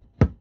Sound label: dig cracky; Too Many Stones